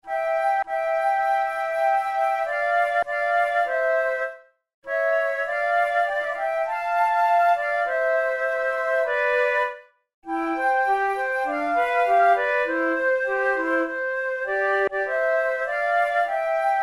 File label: duet; Portrait charmant